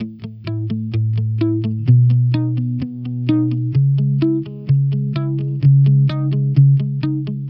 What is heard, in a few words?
Electric Pop Guitar 128BPM C#min; Vengeance - Essential Clubsounds Vol.4